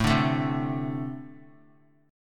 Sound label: A-Minor Double Flat 5th A Guitar